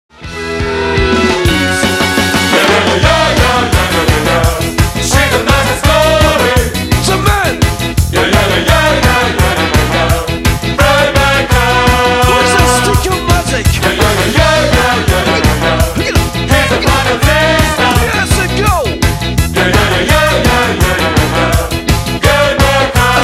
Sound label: Down Beat Stomp